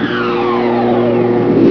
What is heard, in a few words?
Elevator Down